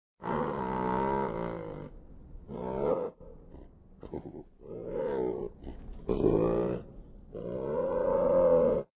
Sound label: dikobraz middle idle